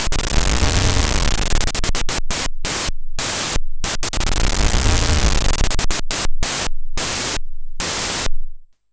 Pulse, Filtered Noise

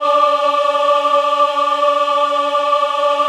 D4 MW AH, Choir; USB Soundscan vol.28; Acoustic & Synth; AKAI

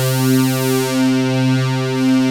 FATTY SYN, DANCE; Pro-Rec - Synthsonic; Z1 DANCE

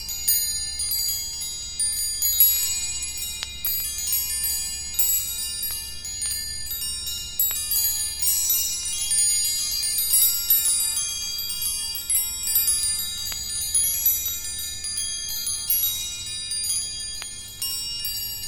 Crackle Rod Loop

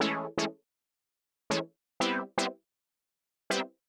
120 Stabs A, Keys, Nu Jazz House; PowerFX

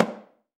AFRO.TAMB, AFRO.TAMBORA

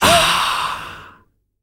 AAHHH, World Voices, Afro noise; ILIO; Vocal Planet VOL-5

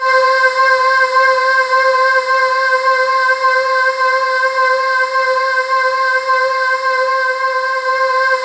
C5, Synth Leads

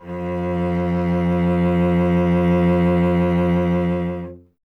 STR cello F, Cello; Miroslav Vitous; Solo Instruments VOL-1

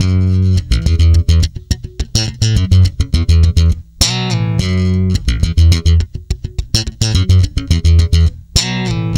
F#, tweaky, bass; Spectrasonics; Bass Legends

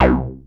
OB C3 -L, Rare Synthesizer; Hollywood Edge - Giorgio Moroder Rare Synthesizer Collection; OB 4 VOX.

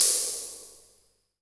SOLT CYM, Solton Disco-64

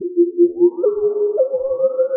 Quasar Whistle 110BPM; sci-fi; Loops

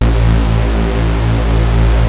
Prophet 5 A2, Synthesizer; Hollywood Edge - Giorgio Moroder Rare Synthesizer Collection

